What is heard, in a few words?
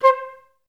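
flute staccato C4; Best Service; Peter Siedlaczek's Advanced Orchestra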